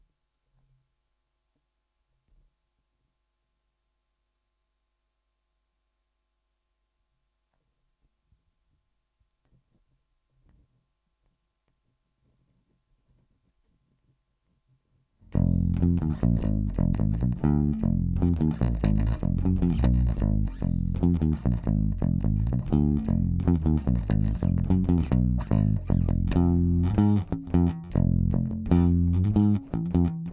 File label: Bass